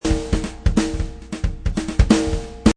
Loop Jazz Jam Bass Drum Snare 180, Jazz drum loop at 180 bpm.; Drum Loops